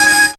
synth g#, Dance Synths, Stabs & Hooks; Best Service ProSamples vol.37

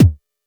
Noisy bd, Jomox Airbase99

